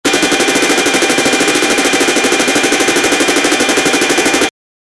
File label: round winning sound, competition